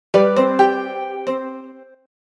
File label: notification